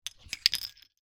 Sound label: technology 2 headphone jack adaptors move together in hand